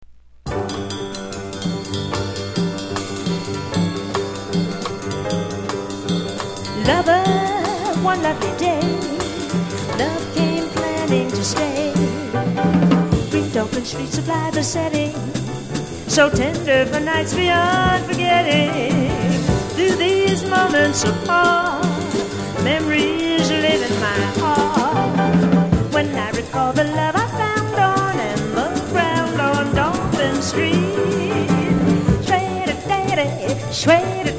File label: jazz quartet